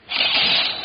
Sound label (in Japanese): キシャン・・・, 生活音; 生活音系効果音